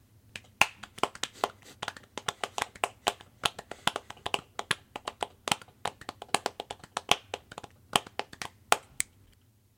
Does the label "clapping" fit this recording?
Yes